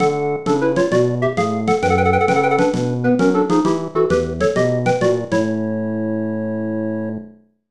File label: Victory!; Super Mahjong Taikai; SNES